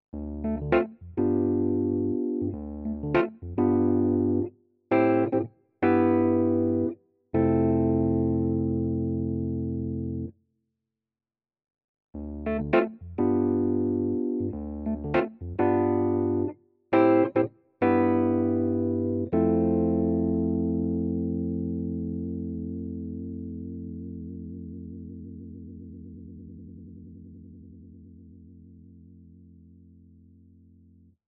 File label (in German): Electro EPiano mit Vibe Effekt